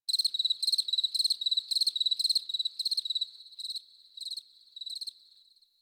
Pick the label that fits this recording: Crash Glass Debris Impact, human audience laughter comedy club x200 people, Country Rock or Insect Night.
Insect Night